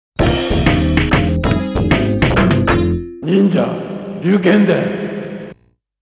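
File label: Round Clear (arcade)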